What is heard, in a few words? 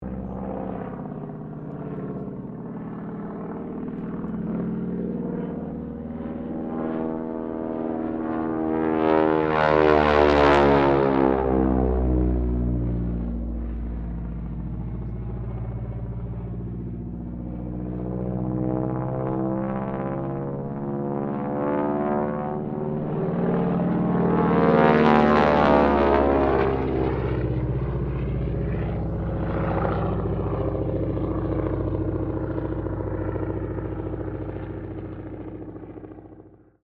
AIRCRAFT AT-6D HARVARD MK III LOOP; Harvard Training Aricraft; Airplanes